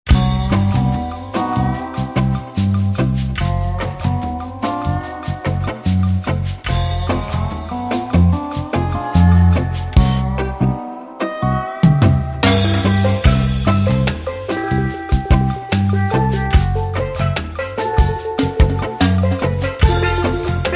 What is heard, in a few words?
Steel Drum Dub